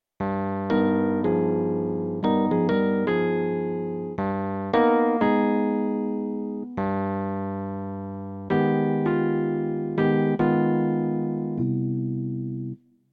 smooth, jazz piano

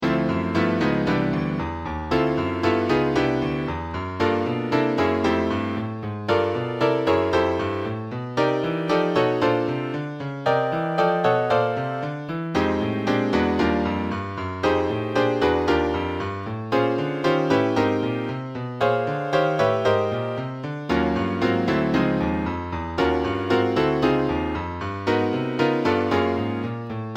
IIm7 V7 V7alt IM7

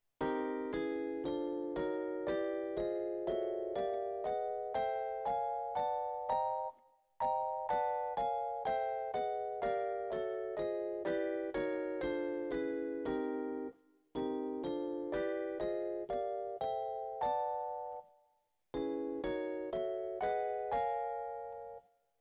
minor 7th, jazz piano

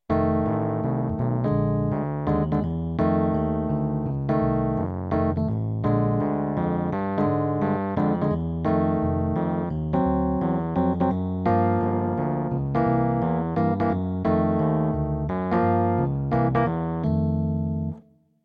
chord

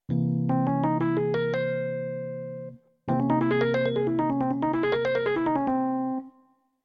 jazz piano

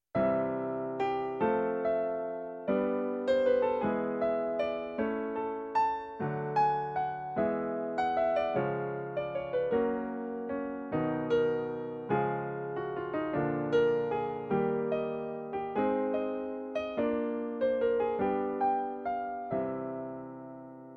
major, jazz piano

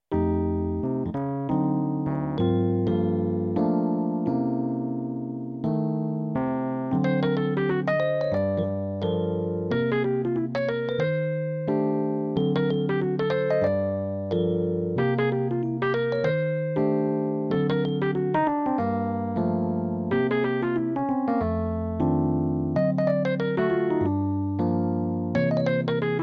loop, jazz piano

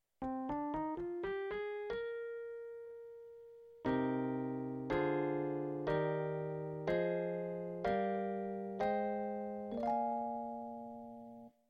diatonic minor

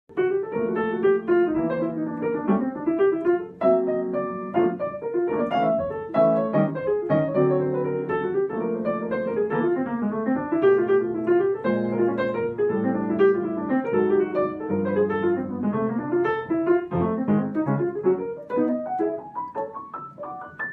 fast swing, jazz piano

